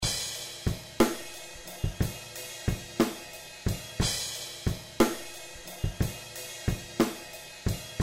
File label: drums